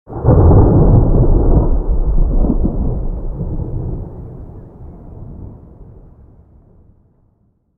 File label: thunder thunder